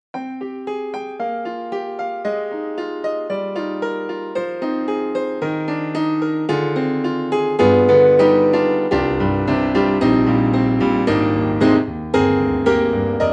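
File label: Japanese Rock Grand, piano; KORG KRONOS 2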